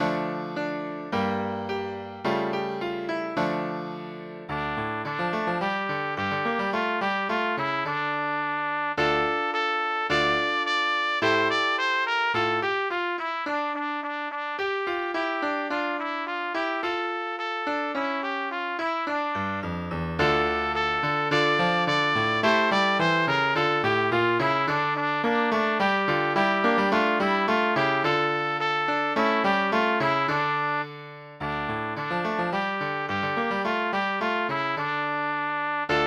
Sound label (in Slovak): Zahraj cigáň - pre trúbku a klavír